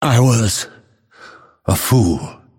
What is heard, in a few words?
death; dota; phantom assassin